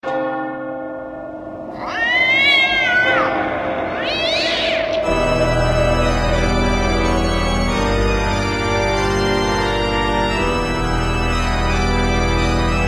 game startup halloween; source engine; tf; holiday

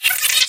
nanobot; Portal 2; Source Engine; video games; npc

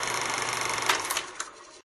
stop record; replay

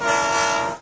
train horn; Source Engine; cstrike

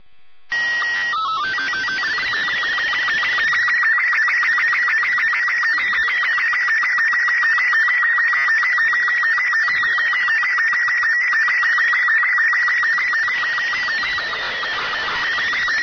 ambient; Portal 2; Source Engine; video games

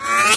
whizz; weapons; source engine; dod

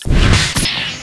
pod close; ambient; source engine; hl2; citadel

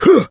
melee swing, player survivor voice

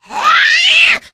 spitter spot prey, voice; source engine; left 4 dead 2

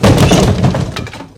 wood crate break, physics

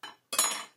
dinner plates, ambient materials; Source Engine; video game

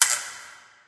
light flicker; Source Engine; Portal 2

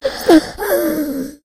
death, infected; Left 4 Dead 2; Source Engine

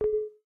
windows user account control; operating systems

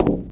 glass, footsteps, survivor, run; player; source engine; left 4 dead 2

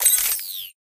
map open; ui

